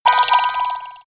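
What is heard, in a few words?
short sound; Короткий Звук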